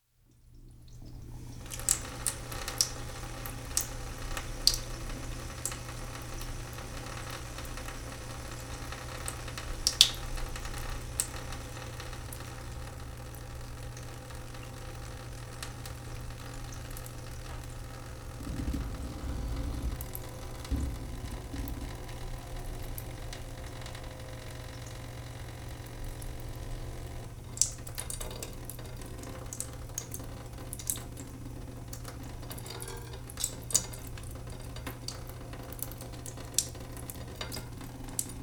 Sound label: Sink Leaking Water; Sound Effects